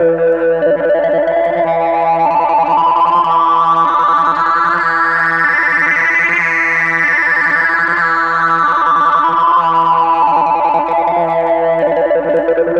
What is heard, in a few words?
PAD; GrooveMaker demo; Serious Software; MacWorld 1999 April